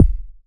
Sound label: KCK, Yamaha TX81Z synth drums